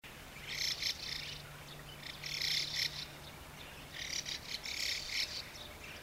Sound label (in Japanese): mukudori